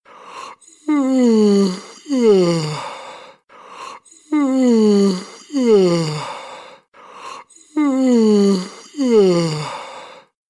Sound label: yawning